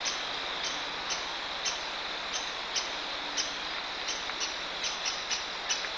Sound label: bird